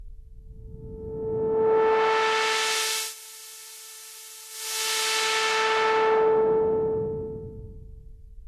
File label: Bandpass Noise; virusc; Über dieses bewegt sich die Cutoff-Frequenz von unten kommend, so dass man immer nur einen Ausschnitt des Klanges hört.